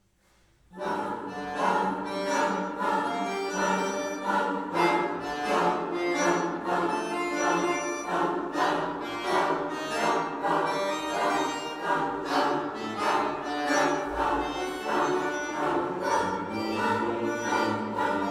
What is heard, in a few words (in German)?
Chor; Frühjahrskonzert 2018